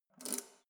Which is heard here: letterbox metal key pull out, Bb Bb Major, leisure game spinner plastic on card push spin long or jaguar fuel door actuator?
letterbox metal key pull out